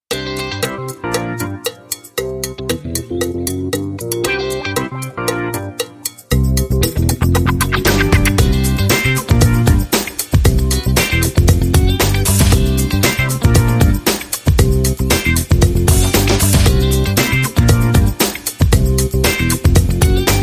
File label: Funk That Jazz Short Rhythm